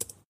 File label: spacebar